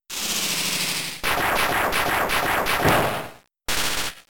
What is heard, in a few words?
Volt Tackle; Pokémon SFX Gen 3 Attack Moves; RSE FR LG